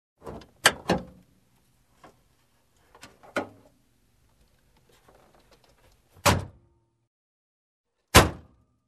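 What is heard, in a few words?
Sound of hood opening and closing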